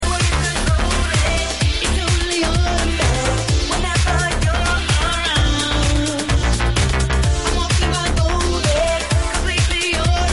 club ringtones; Funny RingTones

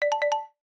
quickly, notifications; OnePlus 6 Ringtones

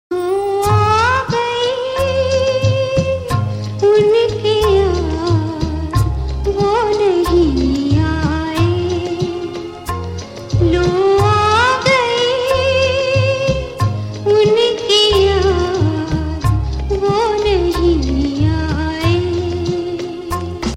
Hindi old Sad Ringtone; Hindi Movie Ringtones

